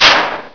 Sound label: metal, debris; Source Engine; bshift